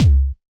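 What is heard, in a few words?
Bass Drum Hard Distortion; Yamaha DJX-2B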